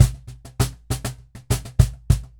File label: Cajon Loop 100BPM; percussion; musicradar